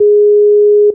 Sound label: Skype calling